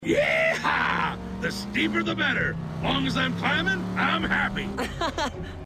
Climbing Happy; Robots In Disguise television show

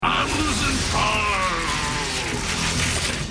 Losin Power; Robots In Disguise television show

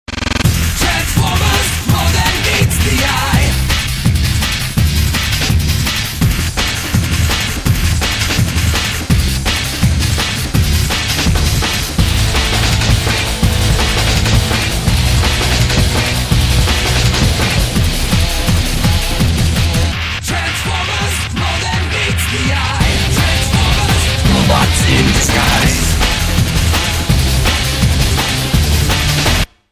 Commercial Music; Transformers Robots In Disguise

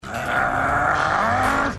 Growl; Skybyte; Robots In Disguise television show

